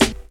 hip hop snare single hit g sharp, Royality free snare sample tuned to the G# note; 90s; snare drum